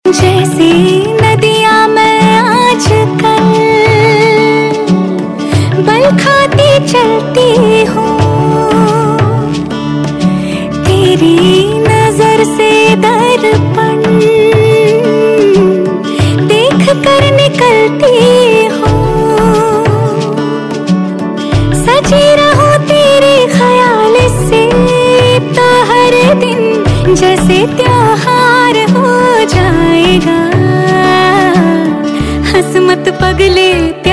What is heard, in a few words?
Hindi Ringtone